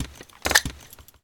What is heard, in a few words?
grenade pin pull